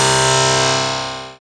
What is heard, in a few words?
SYN Elypt; Best Service Gigapack 1; SYN 02